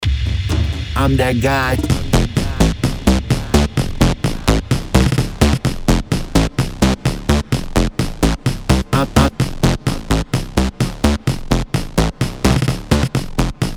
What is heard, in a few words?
bpm 128, tech house